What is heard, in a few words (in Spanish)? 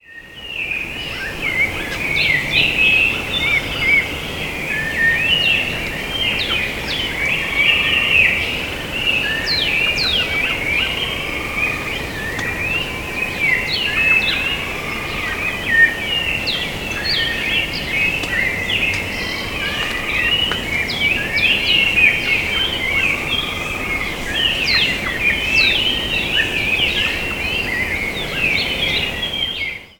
Sonido Arcabuco